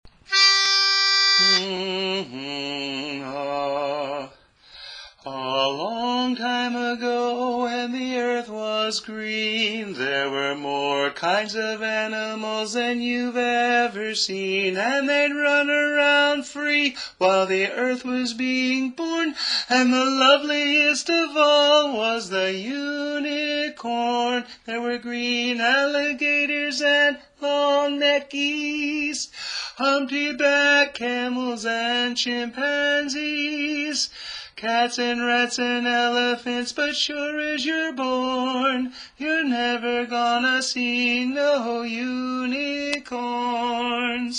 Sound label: (Tenor); learning tracks